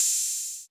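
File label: Open Hi-Hat 808 Saturated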